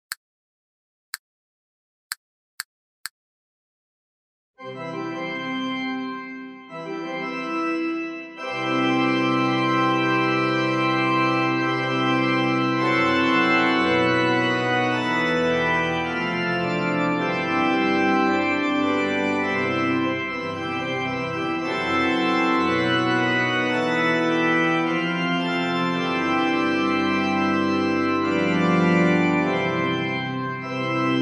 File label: Wedding march C